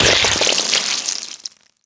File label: chainsaw impact flesh; kfsounds; css